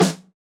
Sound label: snare samples, Rock